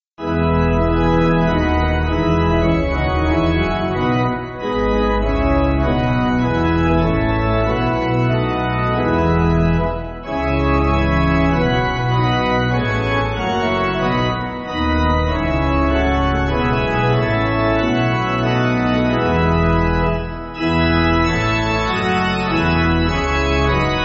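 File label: Pipe, Pipe Organ, Music Backing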